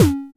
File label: Electro Percussion, House; Drums